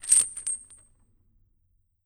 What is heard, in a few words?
Damned Tourment Chains